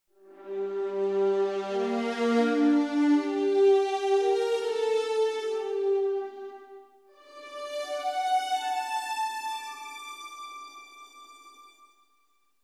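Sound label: strings passage no solos; expression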